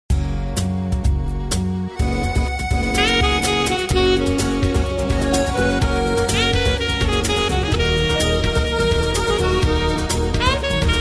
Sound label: walking on the waves, Instrumental TRacks, square dance music